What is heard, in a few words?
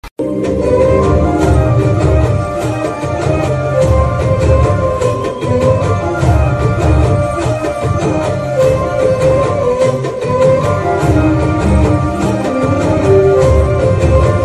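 movie love background music ringtone; upadyaksha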